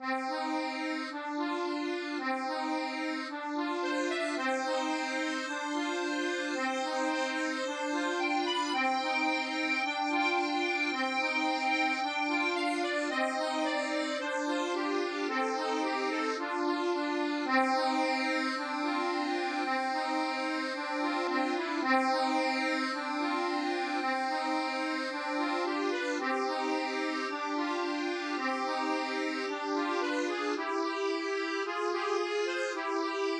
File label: sad song; Accordion (Free Bass) 2016